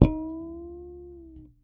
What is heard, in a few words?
Harmonic Bend F#, Bass; Bass X